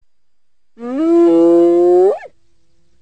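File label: hyena roar sound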